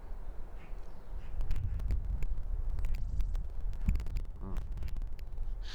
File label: mic preamp clipping; sony xdcam ex1